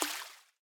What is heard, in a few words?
Lagoon Water Splash